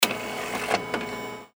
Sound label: printer machine